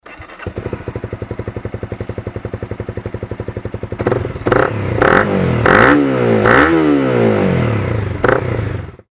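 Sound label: cbr250r, motorcycle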